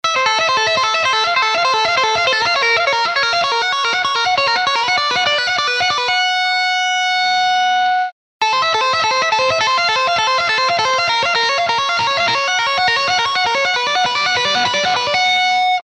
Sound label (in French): tapping pour guitare